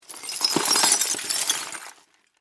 Crash Glass Debris, Crash, Glass, Debris, Movement; Glass (Sound Effects:Materials); BlastWave